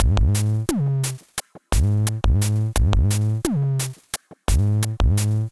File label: 87 Funk, Drum Machines; Fire Uncle Funkle; Ableton